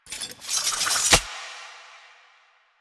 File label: Idle Sword Twirl; Arthur; Hub; Warframe; Ambience; Nineteen Ninety Nine